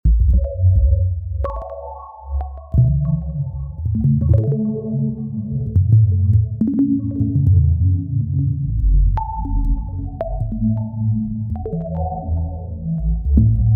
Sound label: Bubbles